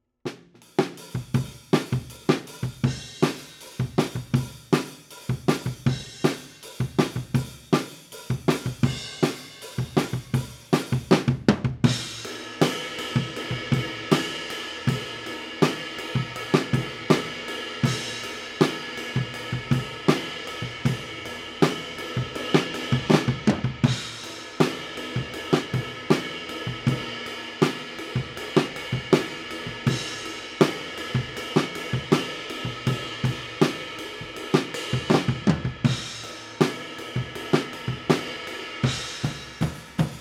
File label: Kit, 160 BPM